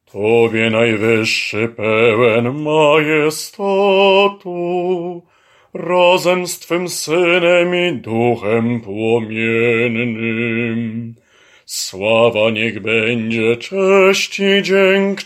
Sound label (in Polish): Oto jest dzien BAS